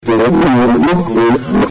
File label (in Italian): serrature